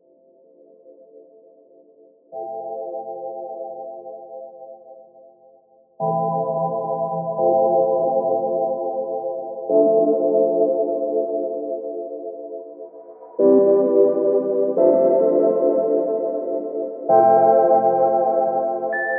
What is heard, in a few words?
chill